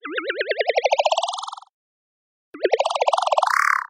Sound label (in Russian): zvuki s effektom peremeshcheniia videokamery